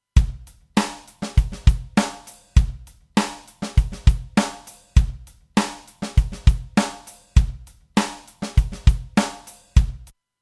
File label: Drums